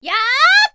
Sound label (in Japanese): yaaaa